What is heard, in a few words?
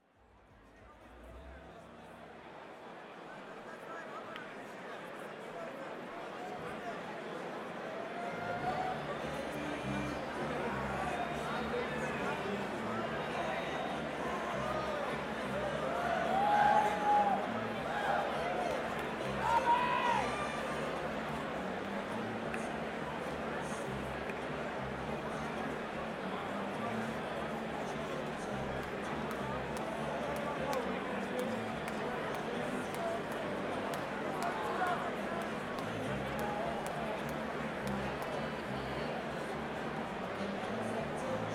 Tuning